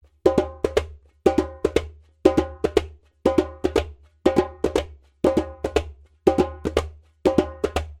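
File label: djembe melody rhythmic 120 beats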